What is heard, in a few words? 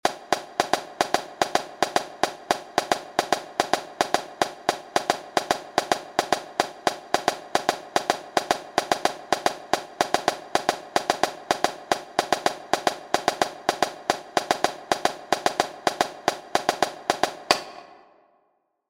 snare, drumline